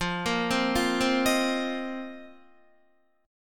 F 7th Suspended 4th F down Guitar; arpeggiated

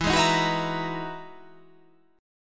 E Major Sharp 11th E

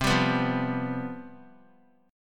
C Major 7th Suspended 4th, down; strummed

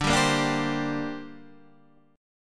D 7th Suspended 4th Sharp 5th, down

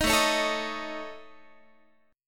A Major Flat 5th A Guitar, chord; AMb5; strummed

